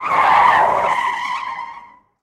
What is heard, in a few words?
skid long; Blind Drive